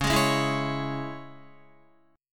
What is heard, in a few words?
C sharp Minor down Guitar; C#m; strummed; JGuitar